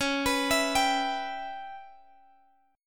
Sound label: Db Minor 7th Flat 5th Db down Guitar Open G